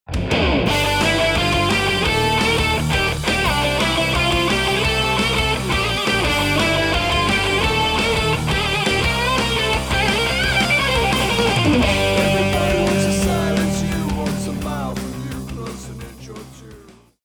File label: Lead Guitar Boosted